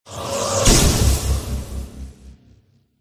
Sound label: boss buff; eldive